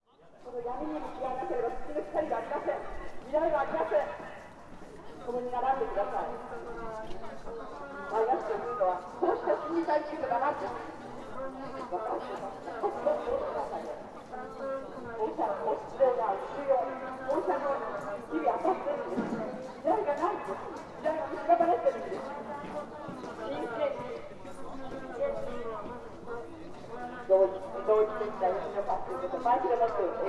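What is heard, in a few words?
noisy group, Fukushima University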